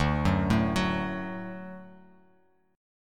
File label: D Major D down Bass Standard; D arpeggiated; JGuitar